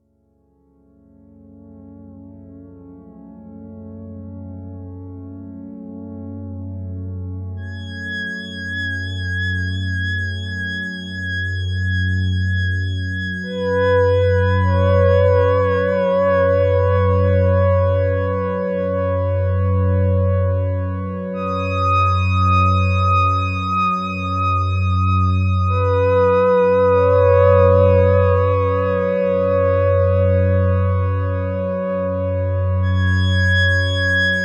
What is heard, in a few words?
Oberheim OB8 Strings